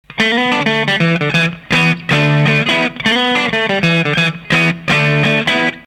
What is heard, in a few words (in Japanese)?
none, VOX AD30VT BLACK 2x12, 基本の音; MIDDLE PU; GAIN全開VOLUME全開; TREBLE３時,MIDDLE全開,BASS１時; Honda Sound Works LOVE typeA